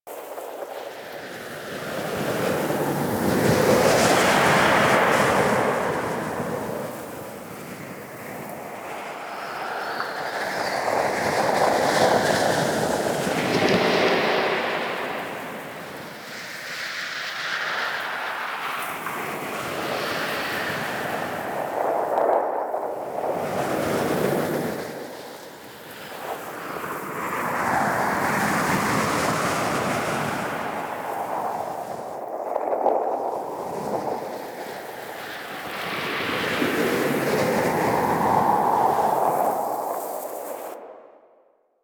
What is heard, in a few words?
Exterior Wind Rough Loop; Warframe; Infested Eris; Ambience